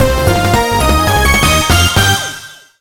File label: Battle End [Success]